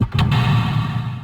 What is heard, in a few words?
escape wham